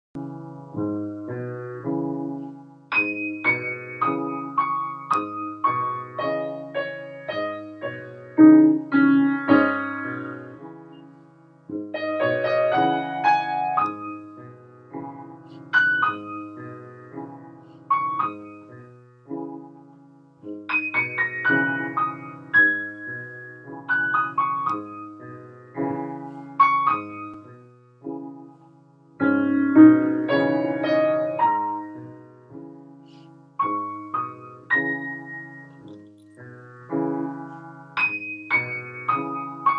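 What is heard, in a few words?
Falling Leaves sandwich, Piano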